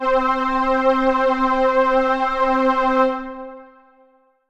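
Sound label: Ensoniq ZR-76 AnaRezz String C4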